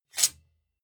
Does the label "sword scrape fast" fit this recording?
Yes